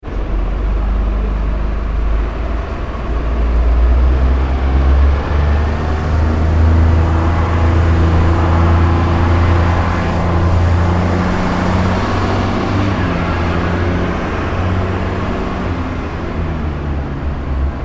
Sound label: ambience urban town centre quiet; Town Centre Ambience - Bus Pulls Away At Start Followed By Distant Chatter , Shouting And; McKinney Sound